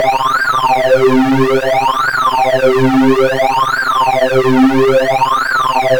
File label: square sync lfo uni; Hardsync